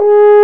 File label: F HORN G#3, French Horn